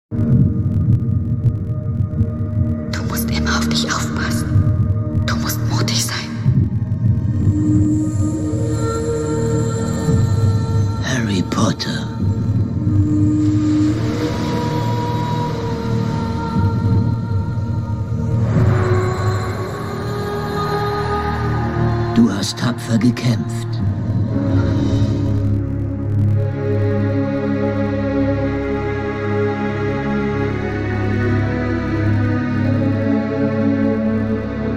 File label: Freestyle music; voltige; individueel dames junior; Frauke Wolter GER; kur